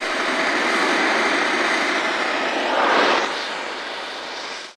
Acetylene Torch, Start Big, Taper Off

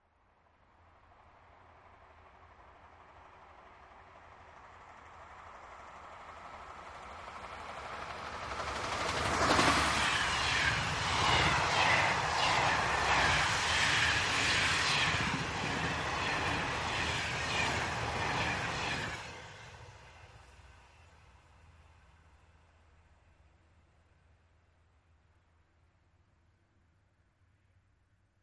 Electric Train ( European )

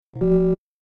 Alarm Synthetic; hi-tech-various

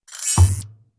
Magic Wand Zap Music; zaps

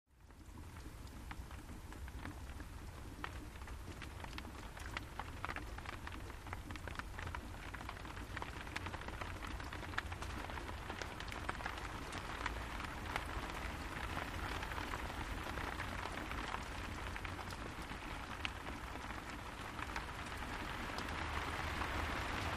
Rain Light To Medium Rain, raindrops, splatty

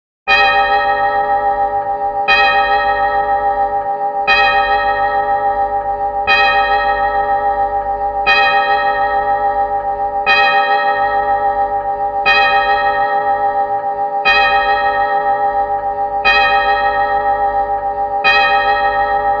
Long Case Clock Chime; clock chimes rings

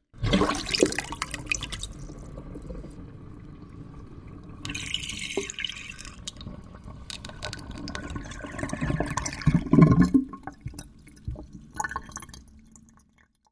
Wash Basin Trap

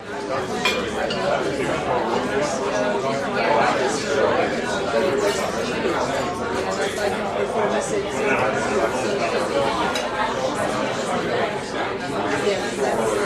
Restaurant Loop, restaurants & cafes

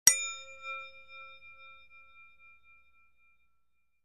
Bell Chime Hollow, doorbells; Hollow Metal

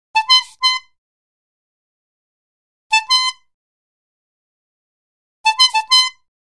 Horn 3 Bulb Horn Honks

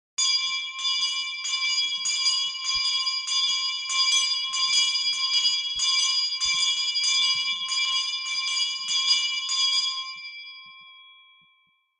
School Hand Bell Ring, bells

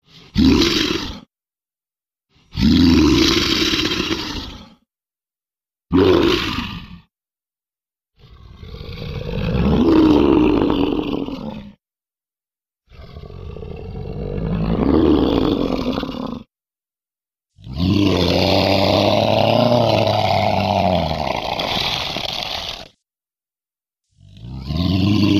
Monster Breath roar

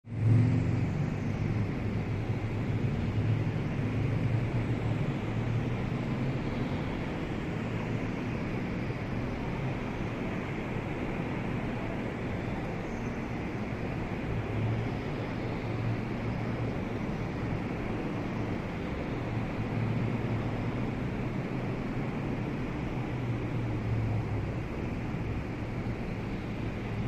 Room With Medium Traffic Wash; traffic, distant

